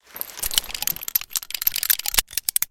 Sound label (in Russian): visipavshiesya patroni iz revolvera